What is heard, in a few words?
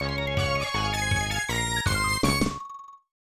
Unused Jingle; Kiteretsu Daihyakka Choujikuu Sugoroku; SNES